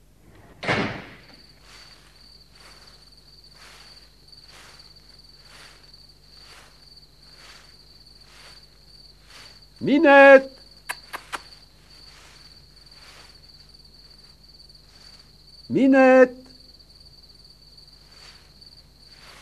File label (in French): paysage sonore